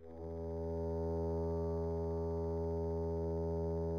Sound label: bass E